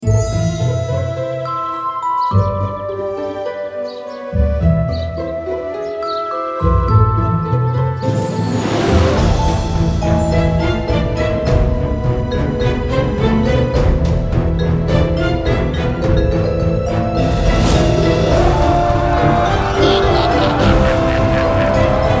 bgm login; Idle Adventure; Longcheng Ltd; Android

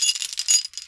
Chain Collision; angry birds rio

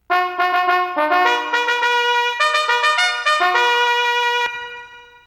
Trumpet (fourty five notes); Harvest Moon: Magical Melody

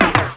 perc

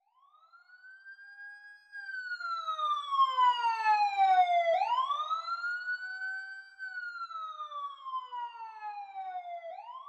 Police Car Departs

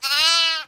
bah; devour 2021 gamerip pc macos

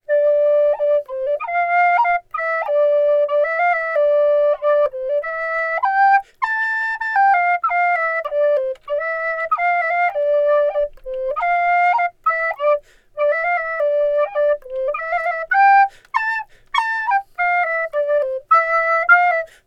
Flute Player Melody; gamerip; The Witcher 3 Wild Hunt

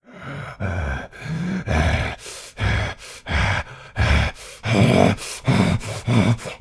Cultist Chase Breath Short; devour 2021 gamerip pc macos

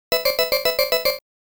Treasure Chest; Libble Rabble

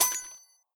Coins; Idle Champions of the Forgotten Realms

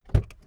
Door Car Open Short

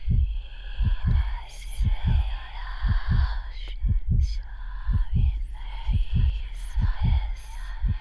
heartbeat medium; yamiboss

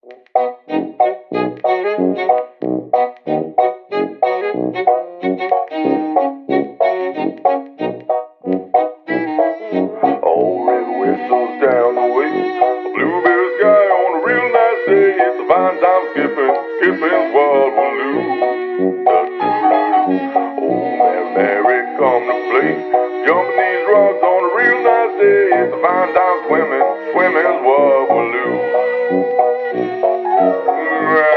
Vintage Tune

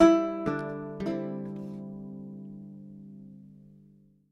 Lute Performance; Lute Anim

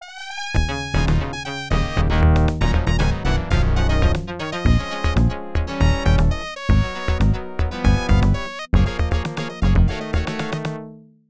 Goal; super sprint; atari system 2; arcade

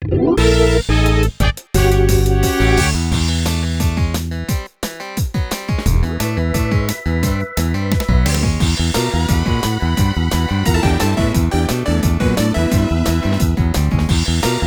Battle; New Super Mario Bros. U Deluxe; Boom Boom

